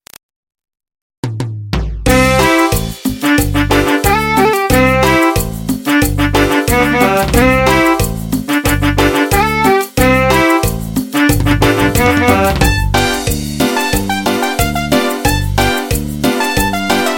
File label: Cumbia